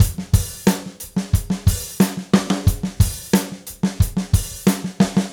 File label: Gretsch Groove 90, 1967 Gretsch Kit, 90 BPM, Drums